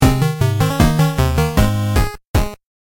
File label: Death Song; collateral thinking wii u gamerip 2016